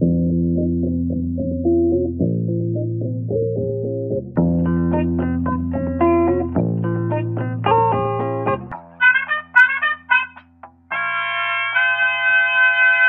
horns, phonky loops

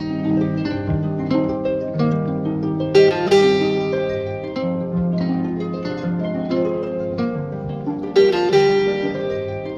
guitar

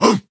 "roo", voice

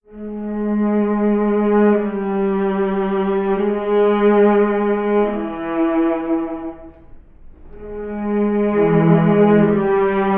strings, Sad Loops

